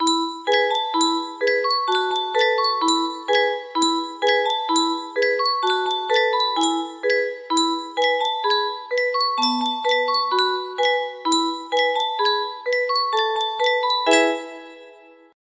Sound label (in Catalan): yunsheng caixa de musica, music box melody